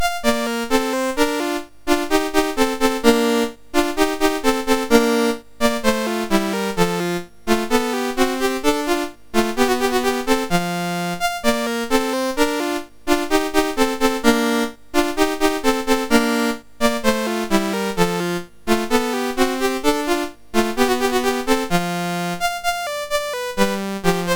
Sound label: Allegro in B Flat Major, MOS6581